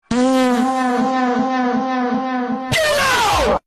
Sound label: farting